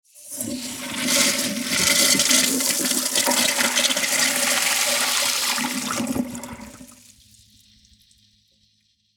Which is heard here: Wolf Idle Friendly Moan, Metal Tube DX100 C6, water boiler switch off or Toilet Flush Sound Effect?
Toilet Flush Sound Effect